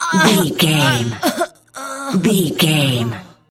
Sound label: voice female death torture